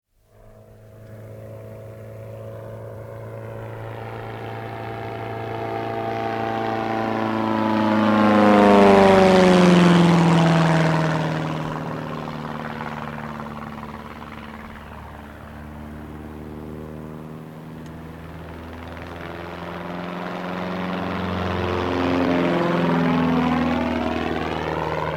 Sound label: Beagle Pup, exterior recordings Pass over several times; Historical; BBC